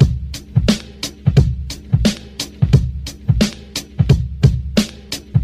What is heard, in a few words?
Drum loops Boombap